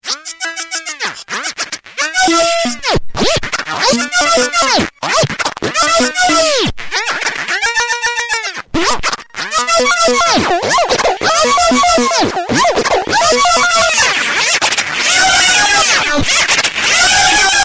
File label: Propeller Lead, HardSID